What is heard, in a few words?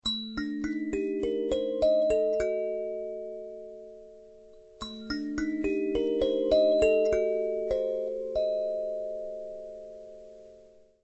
Sansula Hijaz, Kalimbas; Klangproben